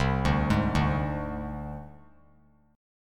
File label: C Suspended 2nd Sharp 5th, Csus2#5 chord; arpeggiated; JGuitar